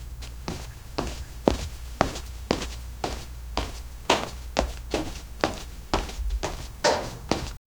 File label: a man is walking with, shoes